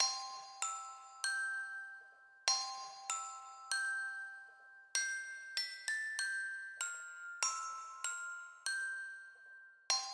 BPM 97

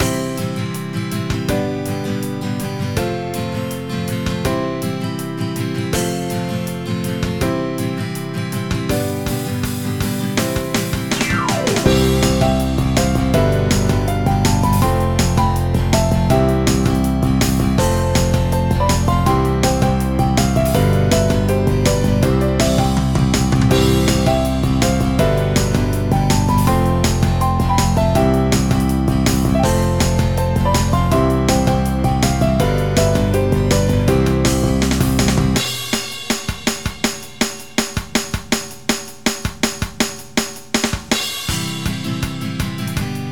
Freedom girl bpm 152

